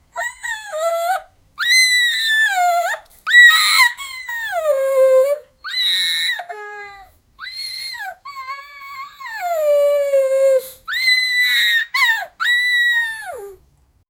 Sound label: crying girl 5 years old; child